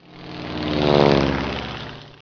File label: seaplane